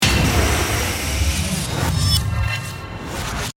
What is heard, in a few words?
Hit To Whoosh, Synth; Sound Design; BlastWave